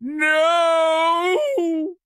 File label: town human far; Blind Drive